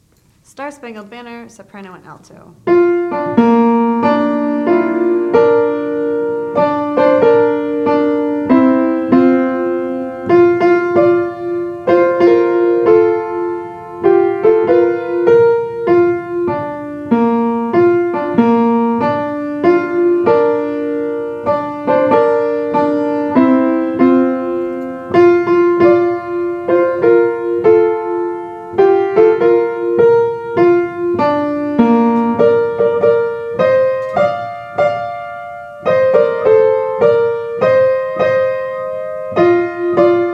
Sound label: SA SSB piano; Soprano Alto Piano; Rosemont Ridge Middle